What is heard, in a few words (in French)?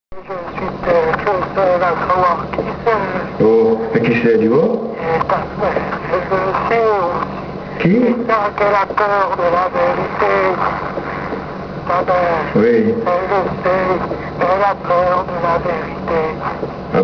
Appel du corbeau